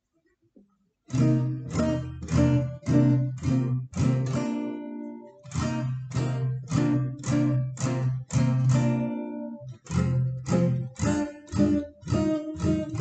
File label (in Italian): Blues minore